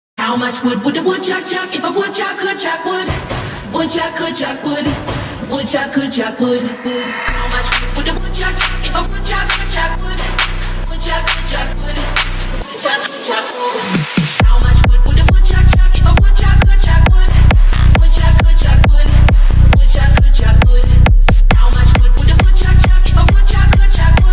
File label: dance bass music; When you are waiting for someone